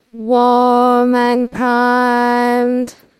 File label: singing